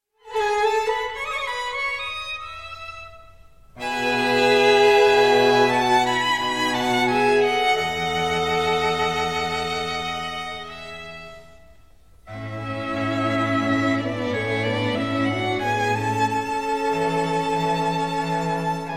string quartet no 2 in D K155; wolfgang amadeus mozart; quartetto italiano

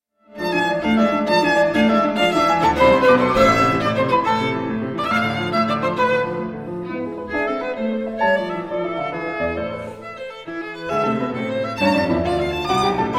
sonata in a minor op 23 for violin and; ludwig van beethoven enrico pace leonidas kavakos

